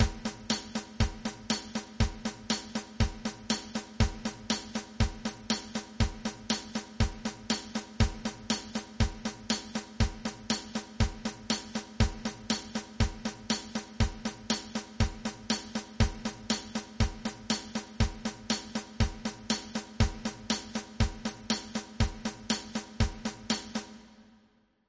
bluegrass train, rhythm; Creating Rhythms

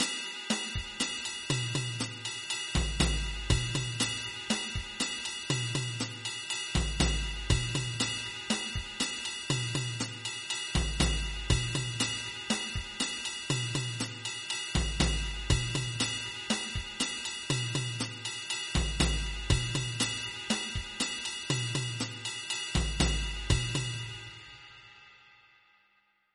mambo; Creating Rhythms

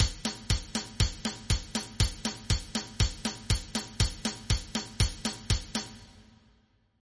polka, traditional rhythm; Creating Rhythms

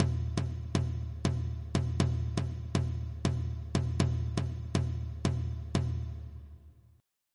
gahu, traditional rhythm; Creating Rhythms

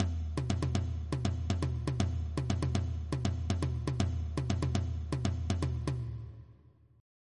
shiko, rhythm traditional; Shiko and its right shift by 9; Creating Rhythms

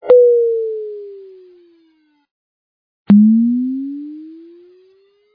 coyote

